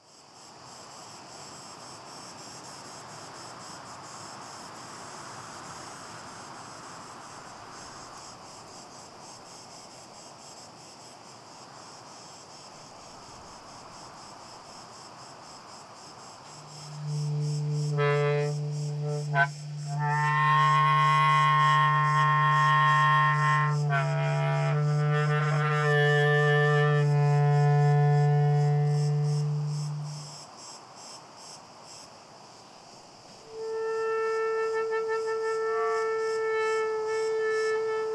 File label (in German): Wind Frei Rau Ton Spiel